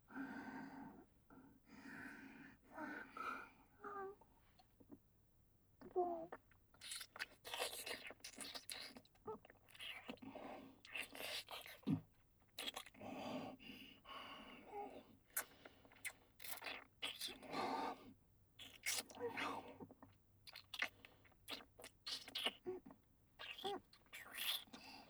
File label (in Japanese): あまあま濃密フェラ